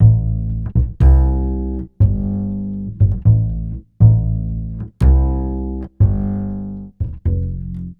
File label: Upright Bass, Ukraine Gypsy; Sonic Foundry; Continental Drift Aftershocks